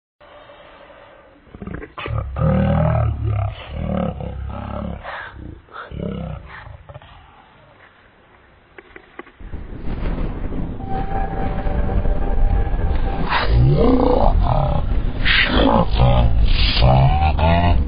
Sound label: dnb; vapesquared